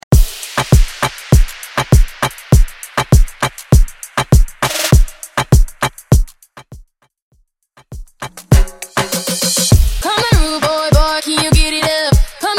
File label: Moombahton; Mainstream VIP Mixshow; 100 Bpm